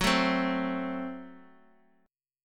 Gb Diminished, down, Bass Standard; strummed; JGuitar

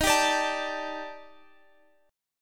Eb Major Sharp 11th, down, Guitar, Open F; strummed

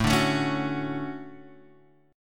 D Major 7th, chord

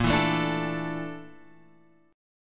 Bb-Major 7th Flat 5th Bb down Guitar Open F; BbM7b5 strummed; BbM7b5 chord; JGuitar

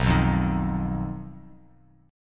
B Major Flat 5th B Bass Standard; BMb5; strummed; 7,2,3,8

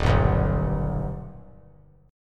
D sharp Augmented D sharp, chord; D#+ strummed; 3,2,2,5; JGuitar

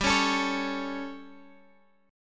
G Suspended 2nd Flat 5th G, Guitar, Drop D; chordshape; down

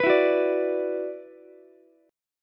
E 7th Suspended 2nd, down, Ukulele; E7sus2; strummed

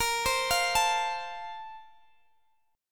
Bb Major 7th Suspended 2nd, Guitar, Standard; x,x,20,17,18,17; arpeggiated

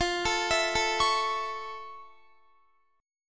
F Augmented Major 7th F Guitar; F+M7; arpeggiated

